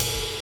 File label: Ride; Touch Loops; ClassicBreakSessions